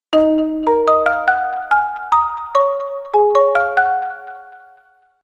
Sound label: marimba, notifications; Mywigo Halley